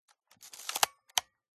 Camera Film 35mm SLR PRACTIKA DTL3 Film Advance Wind lever; MKH8040; PMSFX